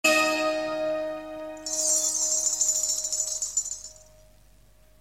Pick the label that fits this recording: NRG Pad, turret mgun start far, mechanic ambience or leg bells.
leg bells